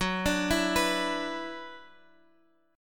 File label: F sharp 7th Suspended 4th Sharp 5th, down, Guitar, Drop D